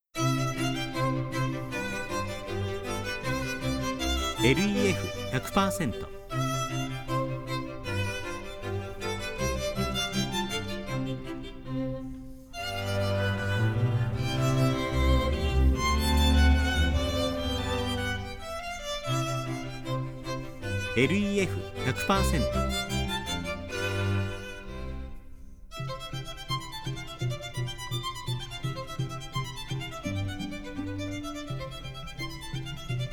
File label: string